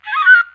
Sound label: flush; CrossCards 1.0